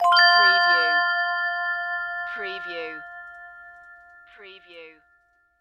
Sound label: Music Vibraphone Chord